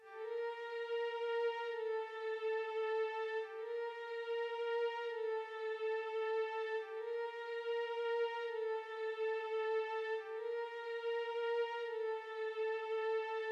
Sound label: 71 BPM G Min String; Trap; Melodies; Loop Stems; Cymatics; Trap Starter Pack; Purple Daze